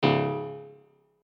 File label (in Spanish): Fallar Disparo; SFX Gameplay 3